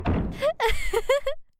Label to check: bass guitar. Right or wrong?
Wrong